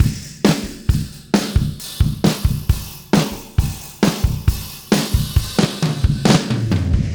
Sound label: Beat Loops; Zero-G; Monster Beats